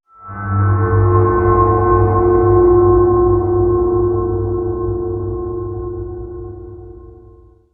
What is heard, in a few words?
Ambient; Barotrauma; Room FX